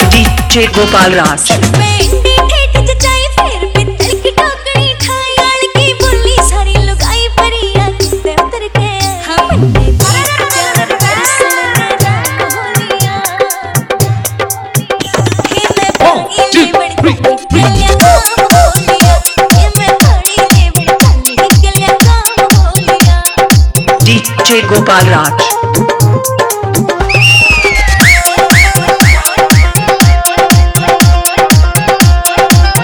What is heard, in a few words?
Hard Dholki Remix Song